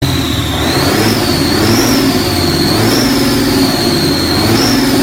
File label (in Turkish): motor sesi